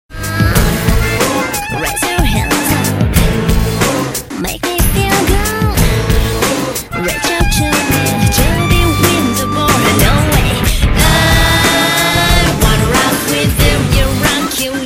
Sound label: rock